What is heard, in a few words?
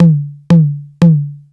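hi tom, drive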